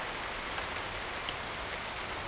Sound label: rain; 3D Game Programming All in One; KOOB